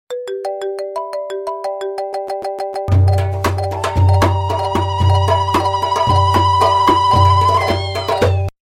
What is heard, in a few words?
arabic iphone